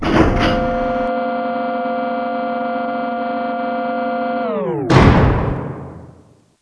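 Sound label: door long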